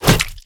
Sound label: Ranged Physical Heavy; Kilta